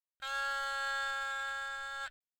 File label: haegeum